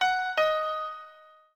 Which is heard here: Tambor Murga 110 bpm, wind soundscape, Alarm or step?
Alarm